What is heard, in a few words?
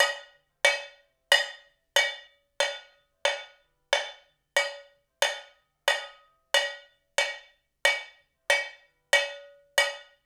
Call And Response Cowbell, 70s Analog Funk; Sonic Foundry (Sony Creative Software); What it Is!